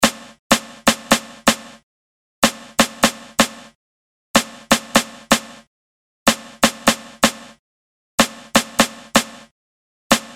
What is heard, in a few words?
Snappy, Snare; Industrial